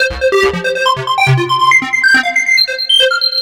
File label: NRG Arp, trance